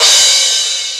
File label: CRASH, 12-Bit Drums; E-MU Formula 4000 Series Vol. 1; Hip Hop Nation